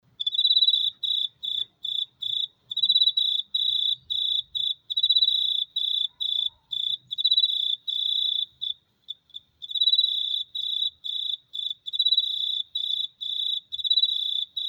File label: Black Field Cricket